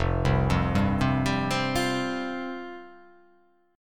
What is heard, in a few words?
F Suspended 2nd F